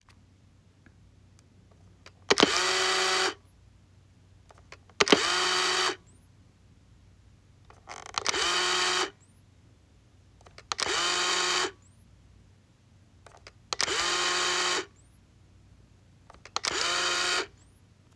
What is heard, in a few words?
35mm Film Camera With Flash Shutter